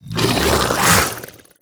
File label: Attack